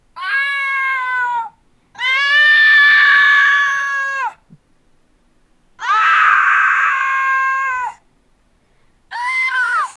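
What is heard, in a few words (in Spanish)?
grito de dolor tono andino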